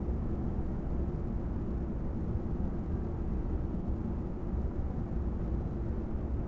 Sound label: hallways ambience; tusken; Star Wars Obi-Wan; XBOX